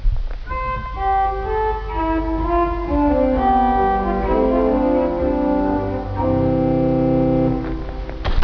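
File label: Salicional 8, organ; Vitus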